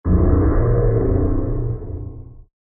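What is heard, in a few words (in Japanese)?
重力魔法 中, 魔法効果音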